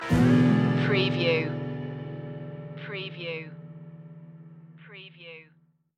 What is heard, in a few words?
fish fry blues stinger